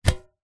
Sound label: foley tin metal cylinder lid on catch; zapsplat